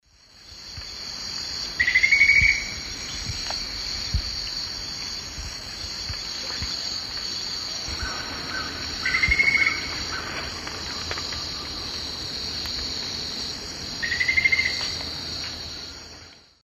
Hemitriccus zosterops, call or song; S. America; Eastern Lowlands

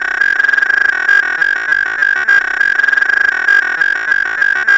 Deuterium Gargle, Noise Circuits; Electronica

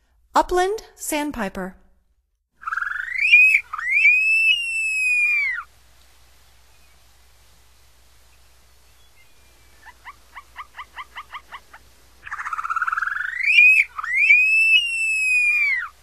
Upland Sandpiper, Songs of Birds of North America; Stokes

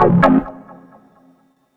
Synth, Techno

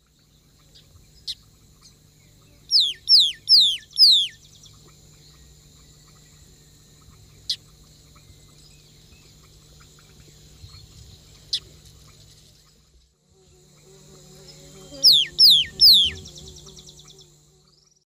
Agelasticus xanthophthalmus, calls and song; S. America; Eastern Lowlands

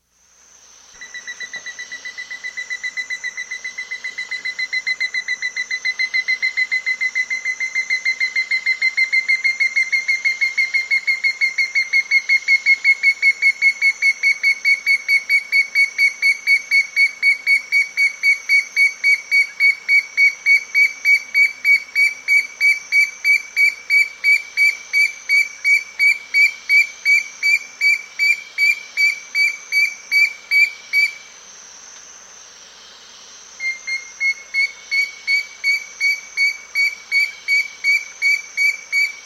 Black-Crowned Antpitta; S. America

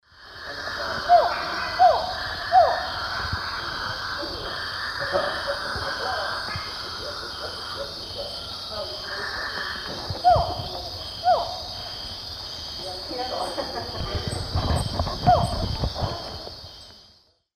Megascops watsonii, calls; S. America; Eastern Lowlands

